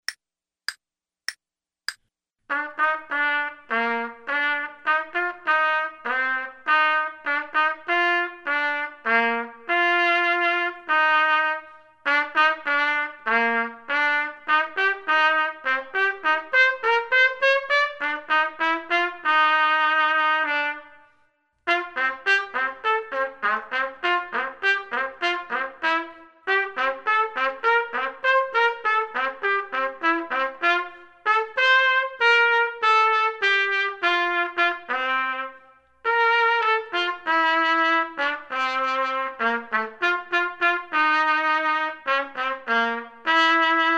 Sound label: trumpet; Selected Duets for Trumpet Podcast